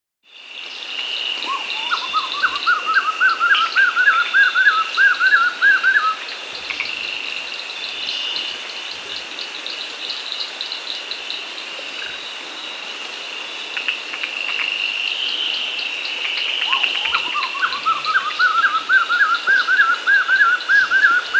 Lyre-Tailed Nightjar (Uropsalis Lyra), Andean Birds; S. America